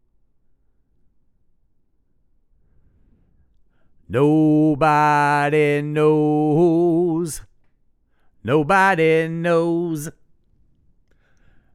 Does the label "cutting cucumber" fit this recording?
No